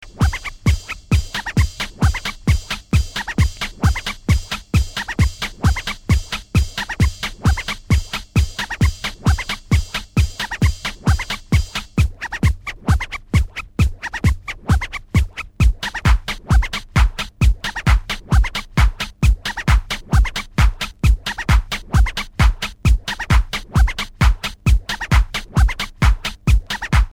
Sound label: Waxscratch Track